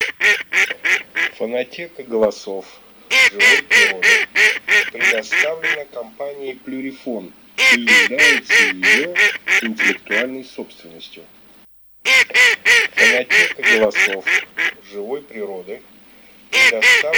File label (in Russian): Кряква Germano; plurifon